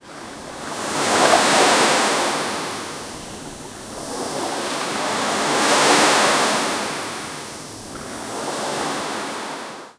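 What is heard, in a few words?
ambient, relaxation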